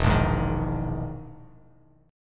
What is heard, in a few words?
E Major 7th Flat 5th down Bass Standard; EM7b5 strummed; JGuitar